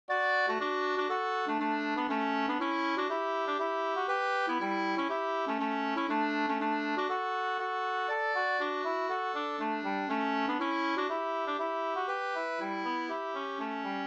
folkdans; Aspelov och lindelov Svsangl4 2st

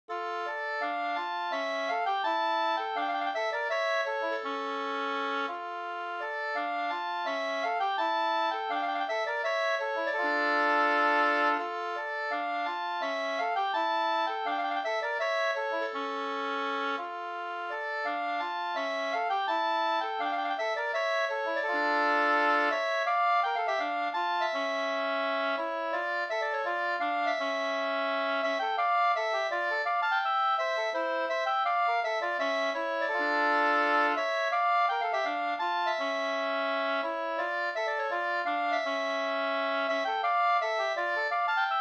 skanklat

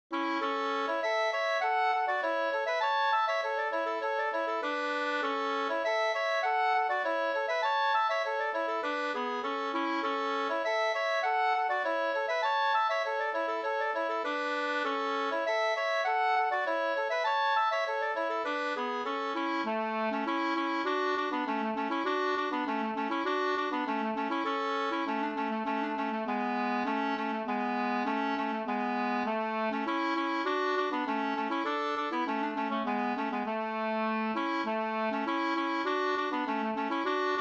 ganglat snoa; Vackra norskan 2st

